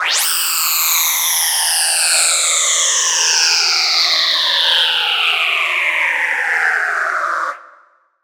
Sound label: downlifter exhalation; stargazer; cosmic